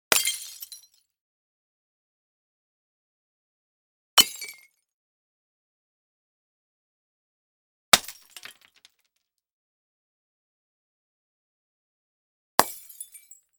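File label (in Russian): bottle, jar smashed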